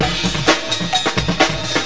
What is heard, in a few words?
beats